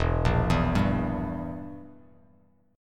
E Diminished E; Edim arpeggiated; JGuitar

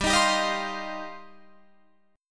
G 7th Suspended 4th Sharp 5th, Guitar Open F; Listen to G7sus4#5 strummed; G7sus4#5 Chord

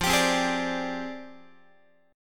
F Minor 6th Add 9th, Guitar; Fm6add9 strummed; x,13,10,10,12,13; 4A,4E,5A,5D,5G#,6C#; JGuitar

